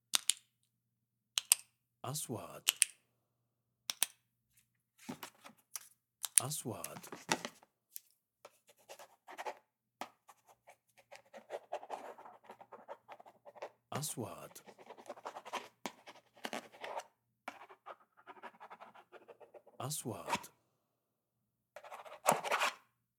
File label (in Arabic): قلم حبر ، ضغط ، توقيع